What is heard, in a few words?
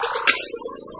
118 perc, perc loop; USB Soundscan vol.20; Fresh Disco House I; AKAI; 90s sample CD